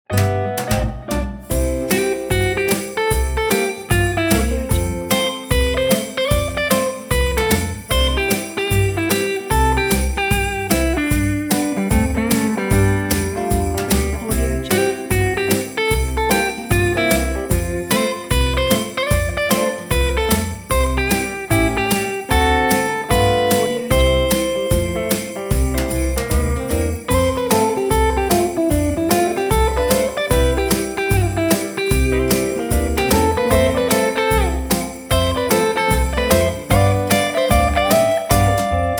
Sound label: a merry country christmas; applehillstudios